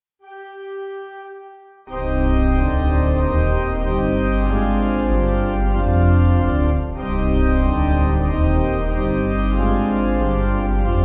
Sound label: Bell Pipe